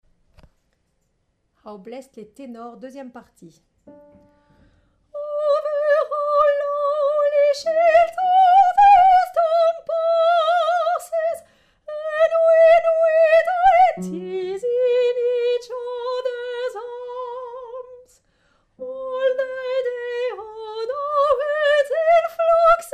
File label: Tenor